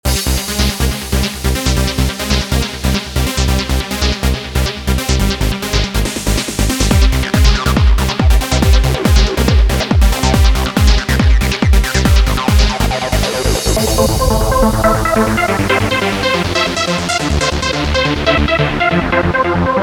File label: Arturia JUP 8000; V Trance Essentials Soundbank; presets